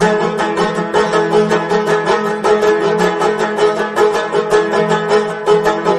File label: banjo; music